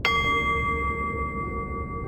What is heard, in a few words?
cuckoo clock; Home Assistant; october clock